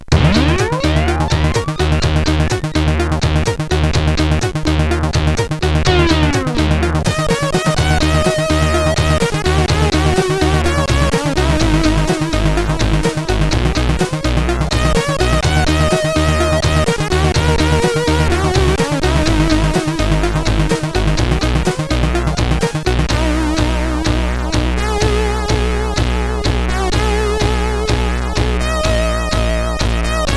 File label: Golf Tune, CSG8580R5